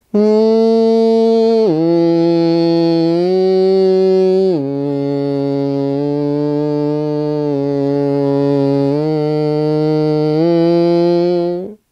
Cello

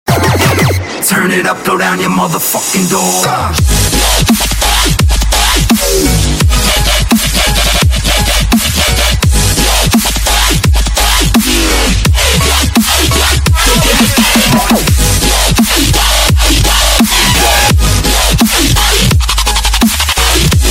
VOYAGE SAMSUNG

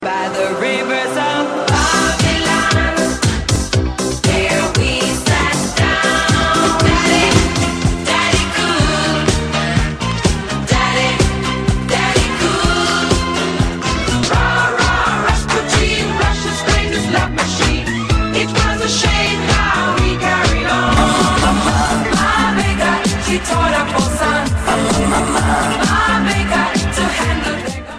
70 S Disco Mix

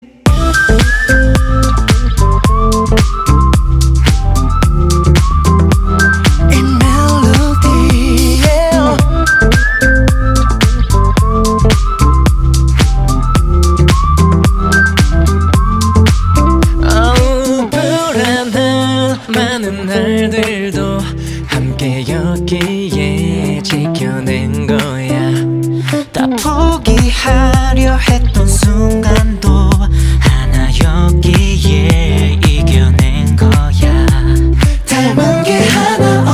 The Melody

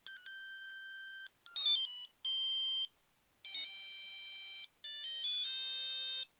nokia, Klingelton

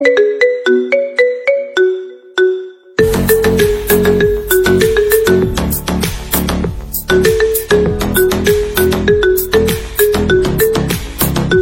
Marimba Remix

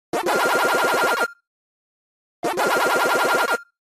Waka Waka; Pacman; Samsung

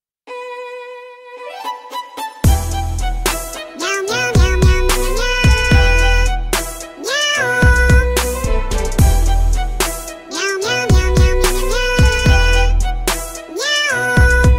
Bongo Cat

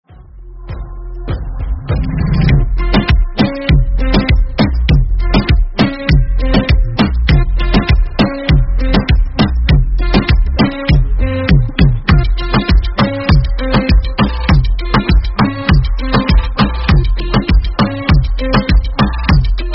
Remix Instrumental

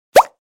bubble popping

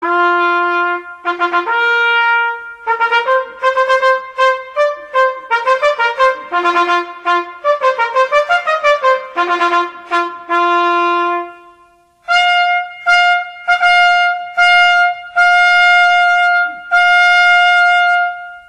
Royal Entrance Fanfare

